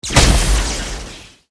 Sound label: spell double blow; archer